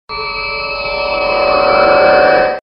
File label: BOOK; games; Book Of Ra